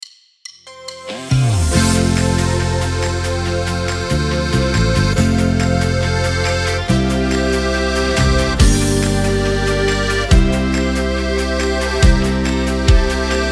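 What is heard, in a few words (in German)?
Karaoke ohne Harmonika ohne Chor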